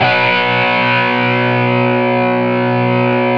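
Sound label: GTR POWER, Power Chords; Club-50 - Foundations Roland; Metal Chds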